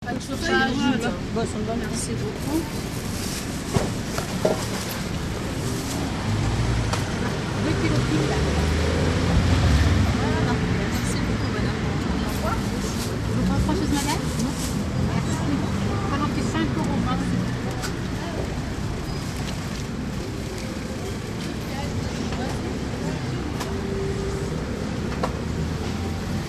sur la place

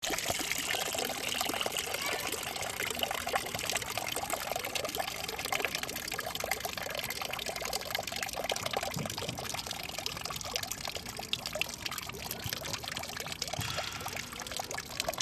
la fontaine

